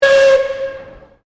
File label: Whistle Steam Blast Short